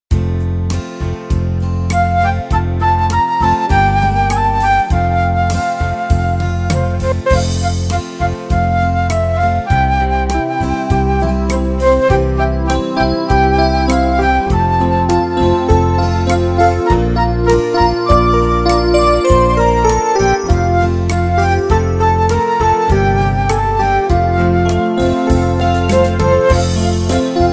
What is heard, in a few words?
beat